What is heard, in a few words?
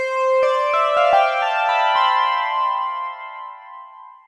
Ring Modulation Original sample